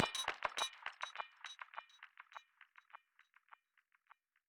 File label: music endloop percus; music ftue endloop percus 04